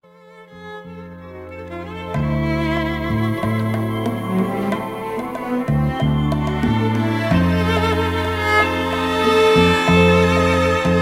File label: bongos